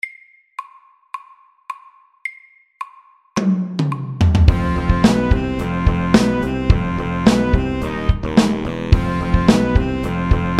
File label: Have some funk FAST 108